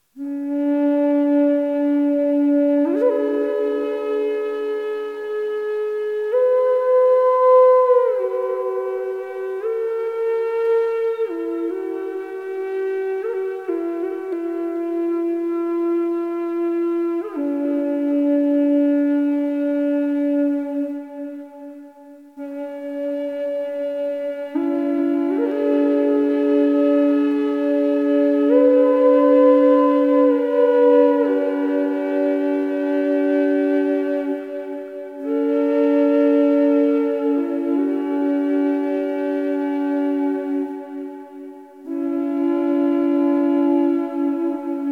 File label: D 432, drone flute; purple heart; d 432hz